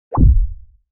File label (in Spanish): sonido corto de salto; jump